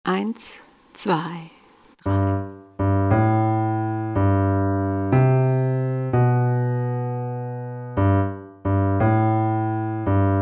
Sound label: Happy birthday to you slow